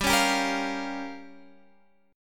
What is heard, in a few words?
G Minor 7th Flat 5th, Guitar, Drop D; chordshape; down